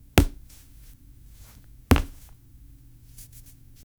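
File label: dropping soft cloth on floor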